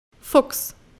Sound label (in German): Button Fuchs; wildtierkamera; kinder